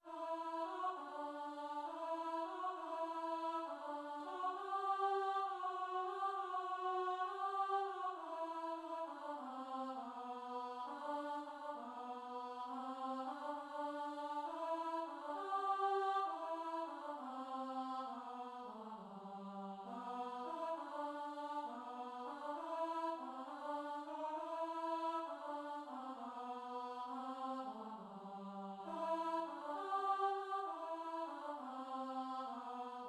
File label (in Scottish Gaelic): chi mi bhuam alto